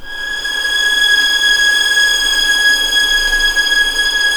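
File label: G#6 legato mf violins, left; East West; The Ultimate String Collection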